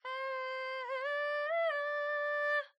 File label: Kulning